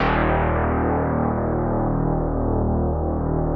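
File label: big Synclavier; Greytsounds; F2-SYNCLAV 2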